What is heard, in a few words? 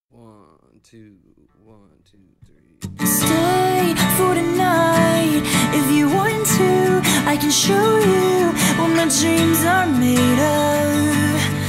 Acoustic version